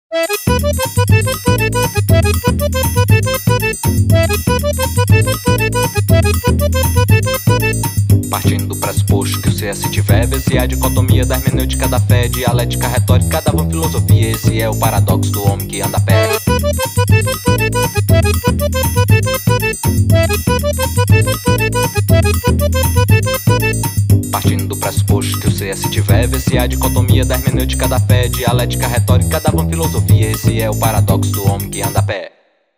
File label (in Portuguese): forró universitário